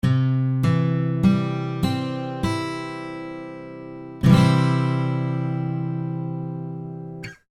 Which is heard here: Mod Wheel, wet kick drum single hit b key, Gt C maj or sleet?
Gt C maj